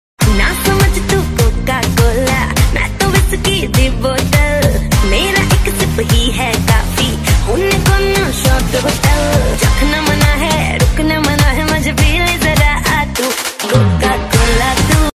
Female Version Ringtone

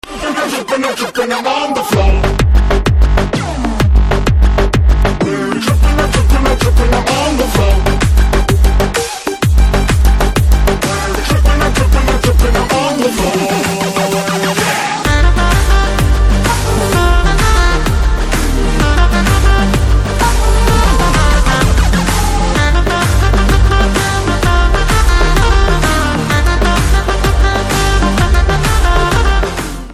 BGM Theme

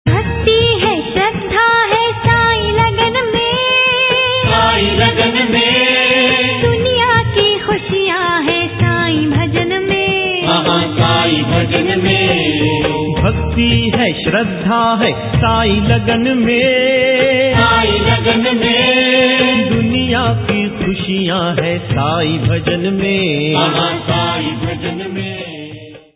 Saibaba Bhakti Saibhajan Ringtone

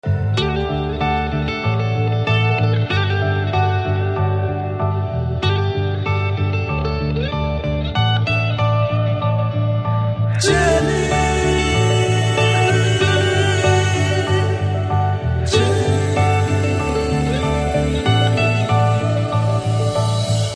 BGM Ringtone; Naandhi

